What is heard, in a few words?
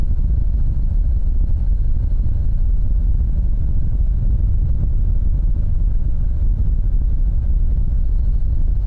loop heavy car winds ac air co